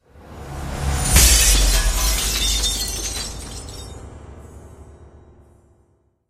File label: Level up; friday the 13th killer puzzle